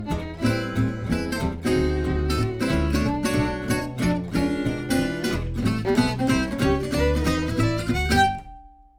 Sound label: swing raw audio mix, guitar; zoom h6; home recording for gj guitar